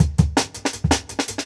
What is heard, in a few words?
165, Jungle Breaks